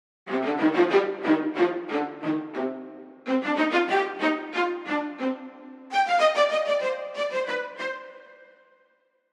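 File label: VIOLE staccato, violas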